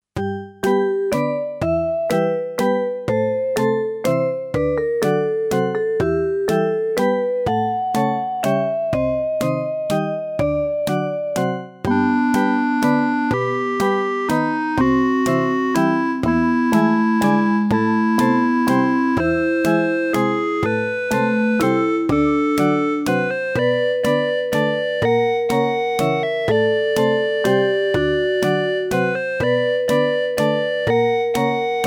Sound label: casio sk 10